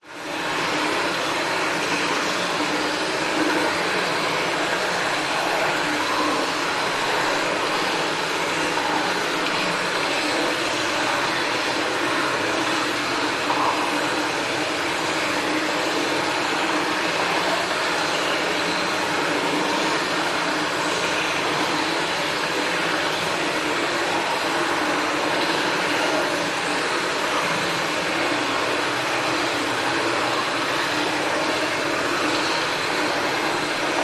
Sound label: nature cave ambience large water run flow drip; zapsplat